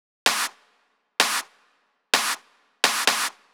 Drum Loop 128BPM Clap; VEC5 Drumloops; VEC5 Multi Loops 128BPM

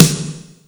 snare, break beats

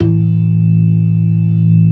ORGAN, C3 PERC; Keith Emerson Signature Series

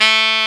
SAX XSAX, Saxophones; All Saxes

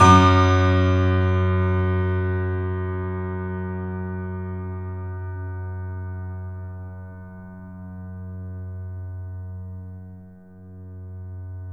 Piano, YAMA SUSTAIN

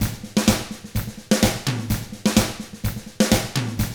127 Drums

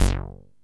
TEISCO, BASSES

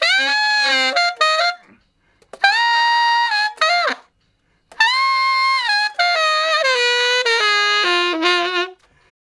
Ten Sax Straight (F), R&B; Solos

